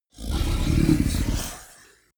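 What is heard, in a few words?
fishman breath grunt, creatures; kreaturen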